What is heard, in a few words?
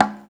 LO CONGA; East West - Phat & Phunky CD2; 90's Sample CDs